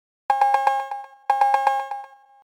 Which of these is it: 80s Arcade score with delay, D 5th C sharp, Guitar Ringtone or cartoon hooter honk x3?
80s Arcade score with delay